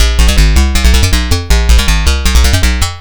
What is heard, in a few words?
BBD Karplus